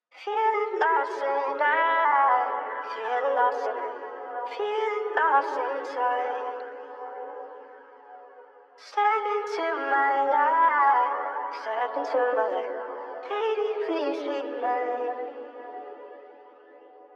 Feeling Lost Inside Vocal 110 BPM F# Min; Cymatics; Orchid Sample Pack; Vocal Loops

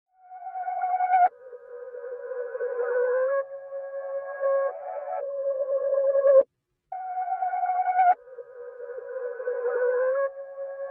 140 BPM B Min Vocals; Loop Stems; Cymatics; Utopia

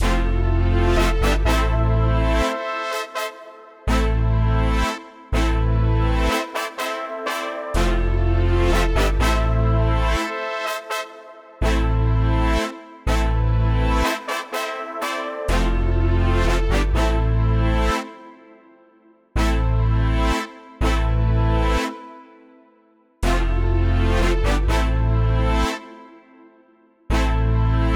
124 BPM A Min, Trap Melody Loops; Cymatics; Nightmares Trap Melody Loops

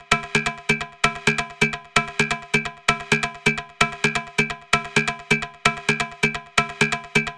Perc 130, Techno Drums; Drum Kits; Stems; Ghosthack; Drum Kit 001

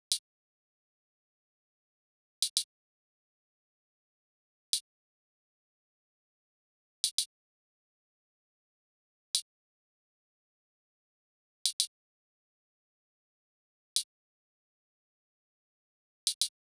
Drum Loop 104 BPM Hihat, RnB; Affection; Cymatics; ANALOG EVOLUTION Beta

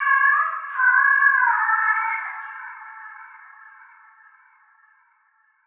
FX - Vocal; Hip Hop; Cymatics - 9God Hip Hop Sample Pack

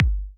Kick, House Drum One-Shots; Ghosthack; Advent Calendar; House Kit

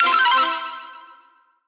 Impact FX; Cymatics; Video Game Sample Pack Vol 1; Galaxy Rush

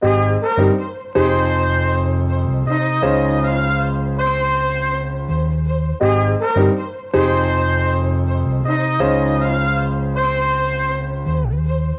Classic Melody Loop - 80 BPM G# Maj; Melodics; Cymatics - Eternity Sample Pack

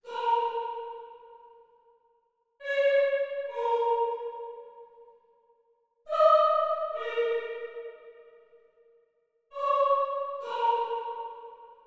139 BPM A# Min Choir; Cymatics; Drill; Savior

